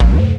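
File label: TALKING DRUM; E-MU Formula 4000 Series Vol. 2; OrbitPercMaster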